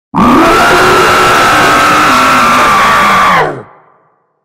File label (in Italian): urlo fortissimo originale sounds scary; viral video